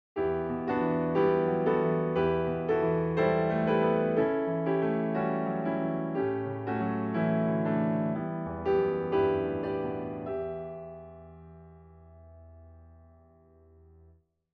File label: 2 Against 3 Musical